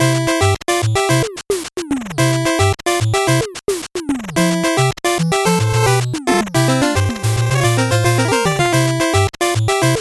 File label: 8bit bgm normal